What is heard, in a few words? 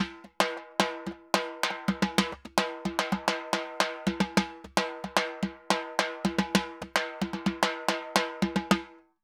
Timba Murga 110 bpm; Best Service; Festa Latina VOL-1